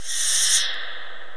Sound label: scifi